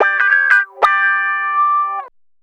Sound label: Guitar Licks; Sonic Foundry (Sony Creative Software); Ambient Grooves; Guitars